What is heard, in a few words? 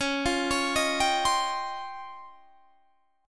C sharp Major Sharp 11th; C#M#11; arpeggiated